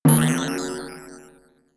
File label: notification; samsung Aleart